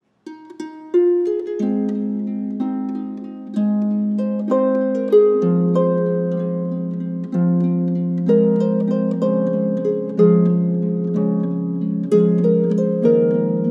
wedding arfa

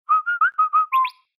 Bird Cicada Notification Seq Day Night

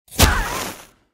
unlodge large object

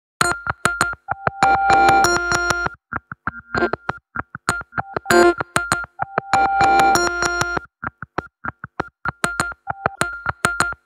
pace

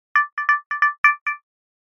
funky arabian; Рингтоны смартфона Micromax, мелодии будильника и сигналы сообщений

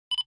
camera focus

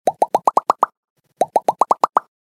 bubble; telefona infinix

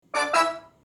hit

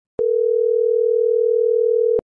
call; Системные звуки и уведомления iOS 18 (iPhone 16, 16pro, iPad)

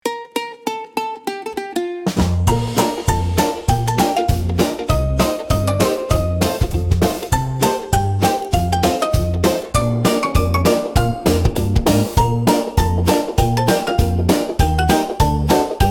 tiny toy parade, schastlivaia detskaia muzyka

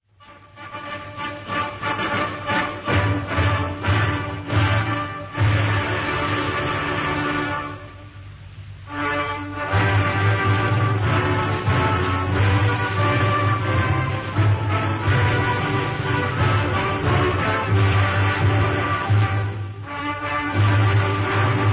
anthem

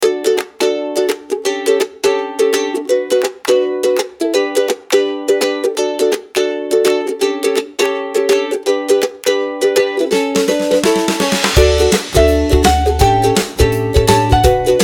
happy background music

